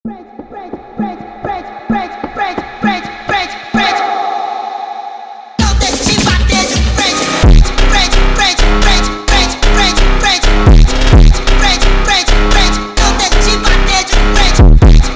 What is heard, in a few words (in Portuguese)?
Phonk Brasileiro